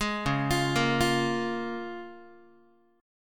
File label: C sharp 6th C sharp down Guitar; arpeggiated; C#6; JGuitar